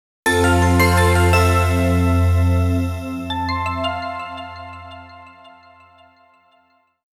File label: Jingle, Title Call; Fate Tiger Colosseum Original Sound Track [Complete Edition]